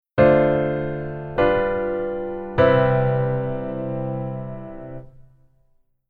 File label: Keyboard Voicing Hi